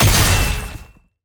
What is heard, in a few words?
Attack Jump; bloom minigame